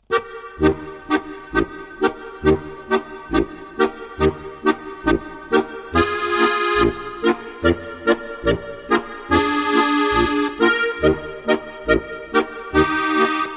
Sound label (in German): boarischer; Volksmusik- leicht gemacht